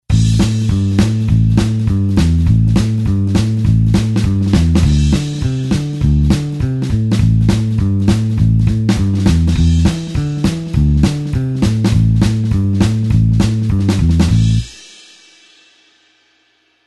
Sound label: blues bassline 2beat A tempo